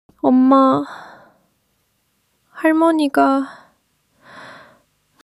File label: crying; monologue